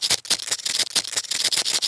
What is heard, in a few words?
termite